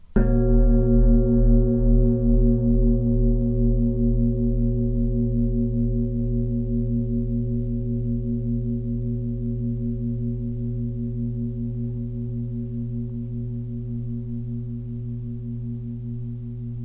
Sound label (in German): fussklangschale